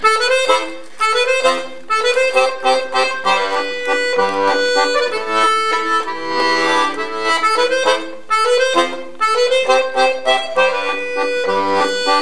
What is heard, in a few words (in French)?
2eme voix violons tempo normal; 4eme partie; Musique d-ensemble